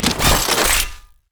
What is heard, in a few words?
swap; jinx skin01